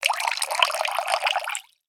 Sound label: water pour shallow; sound effects one